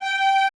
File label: G4 L, Orchestra; AKAI Advance Orchestra Upgrade 97 Vol.1